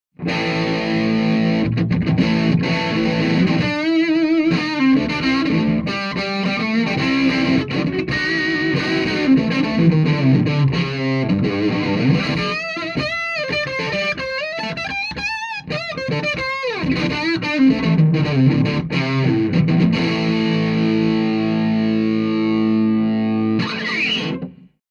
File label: hydra gain up fat sw on